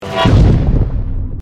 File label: a train speed